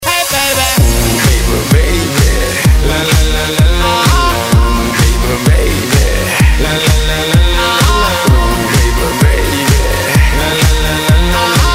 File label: Melody, RingTune